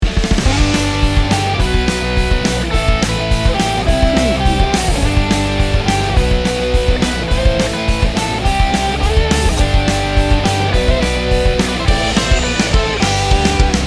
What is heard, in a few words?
pop rock revival